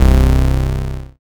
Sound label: BAS MKS-50; Sound & Vision - Gigapack II CD 1